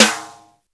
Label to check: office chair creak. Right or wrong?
Wrong